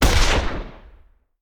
Rifle; Sound Ideas; Guns

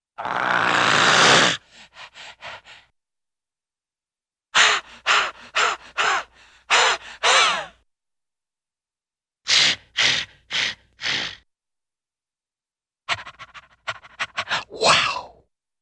Funny Dog Growl & Pants, Cartoon; Hollywood Edge; Cartoon Trax

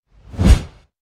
tunnel out; Blind Drive

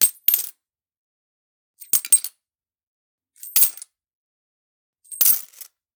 Generic Click Metal Small; boom library; The Interface

